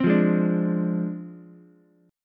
D Minor 7th Flat 5th Ukulele; Dm7b5; strummed

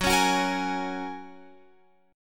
Gb Major Add 9th, Guitar Open F; Gbadd9 strummed; x,13,13,13,13,15

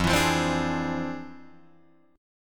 F Minor Major 13th, Guitar, DADGAD

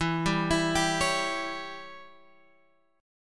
E Minor 6th guitar chord, Open G

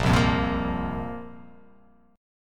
A sharp Suspended 2nd down; strummed; A#sus2; JGuitar

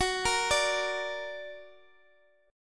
F sharp Augmented F sharp Guitar Drop D; x,x,x,11,11,10

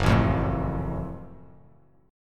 F sharp Minor Major 7th F sharp; strummed

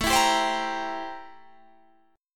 A-Minor 7th Flat 9th, chord

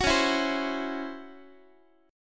Db Minor Major 7th Double Flat 5th Db down Guitar; strummed; DbmM7bb5; JGuitar

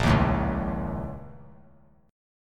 Bb Minor Major 7th Double Flat 5th; BbmM7bb5; strummed; 10,10,12,11; JGuitar

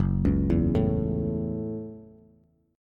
F sharp Minor 7th F sharp, Bass

